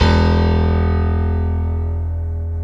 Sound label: PIANO, E; SY PIANO; Best Service ProSamples vol.10; AKAI; House